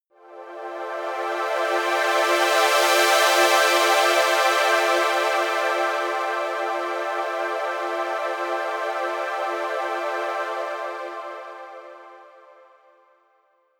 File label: saw pad swell; flaechen